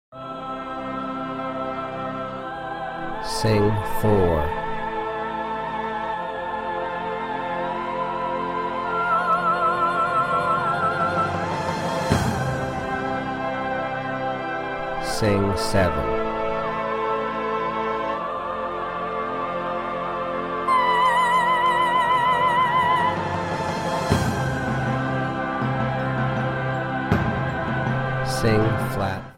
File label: Soprano Key of C; applied ear training; Practice Perfect; Contemporary Orchestration Music Ear Training